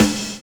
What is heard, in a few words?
RIDE FILL; Univers Sons